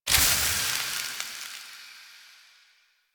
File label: Reload Steam, Rifle; Weapons; Warframe; Thanotech; Trumna Prime